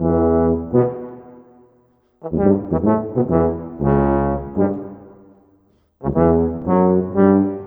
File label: Rock-Pop Trombone Tuba; Orchestral; Sonic Foundry (Sony Creative Software); Orchestral Series VOL-4 - Rock and Pop